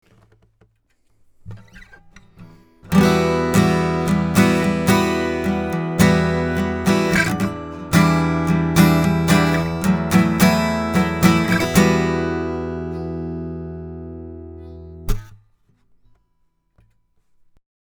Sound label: Rupert Neve Designs Portico 5017 Steel string blend